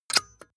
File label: metal click